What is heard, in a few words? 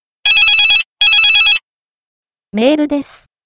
ring mail